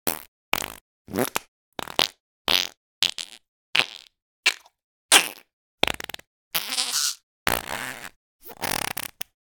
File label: CARTOON - farts, brief and wet; Game Audio Factory; GAFSFX02 - Cartoon Materials